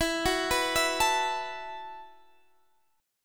E Suspended 2nd Suspended 4th, down Guitar Drop D

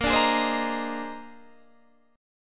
Bb 11th, Guitar, Open D

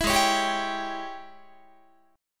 G sharp Major 7th Suspended 4th Sharp 5th, Guitar; G#M7sus4#5 Chord

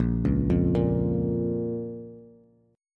Bb Minor Bb down Bass; arpeggiated; JGuitar

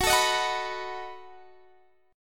B 7th Gb

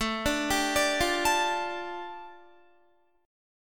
A 7th Suspended 4th Sharp 5th, A, down, Guitar; A7sus4#5 arpeggiated

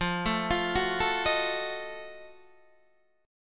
F 7th Flat 9th, Guitar Open D

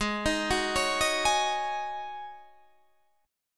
G sharp 7th Suspended 4th G sharp, Guitar Drop D

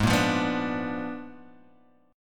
A sharp 7th Flat 9th G sharp

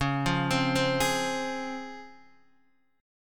C sharp Major 7th C sharp Guitar Open D

